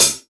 original closed hi hat sample g sharp key

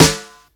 short reverb snare d sharp key

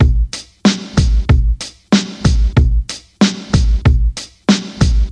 93.7 bpm g sharp boom bap; drum loops

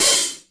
open hat sound c key; Bitdepth 24

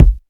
high quality dry kick single hit f key; kick drum samples

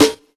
boomy snare one shot a key

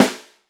subby snare sound c key; tuned drums c key

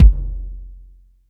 puffy kick single shot f key; kick drum samples

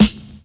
dark sounding snare drum sound g sharp key; Duration 0:01; Channels Mono

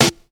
2000s good snare sample f key, modern snare drum

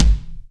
rich high end bass drum sample g sharp key; Duration 0:01; Samplerate 44100 Hz

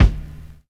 reverb kick one shot g key

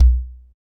fat bass drum b key; Duration 0:02

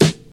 nineties clean steel snare drum e key

